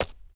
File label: walk, footsteps, hard surface; grunt